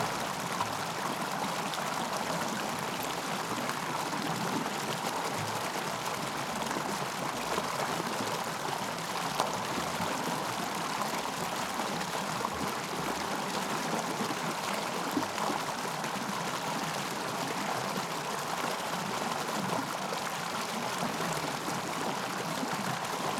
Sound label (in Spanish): Parque agua; ParqueTierno